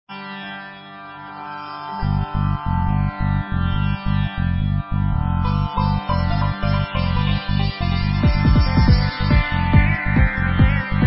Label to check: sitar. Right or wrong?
Right